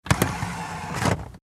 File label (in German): Auto Heckklappe Abdeckung